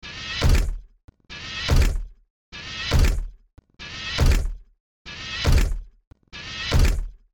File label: robot walk; grade7; distance displacement